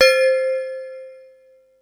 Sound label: mallets